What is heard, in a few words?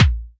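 Pearl SC-40 bass drum; DRUM MACHINES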